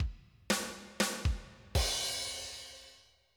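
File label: rock break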